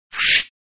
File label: swish